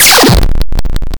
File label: die; Blokos